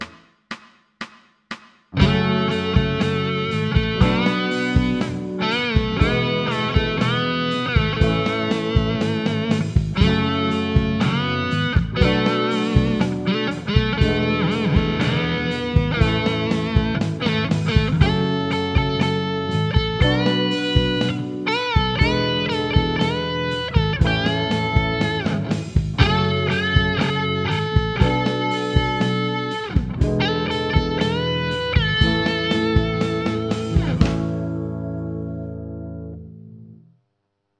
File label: one note solo